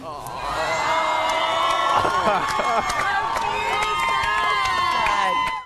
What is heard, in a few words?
aww Cheer